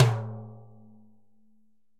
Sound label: Timbales L Open, Latin Percussion; Drum Hits; Ableton; Factory Packs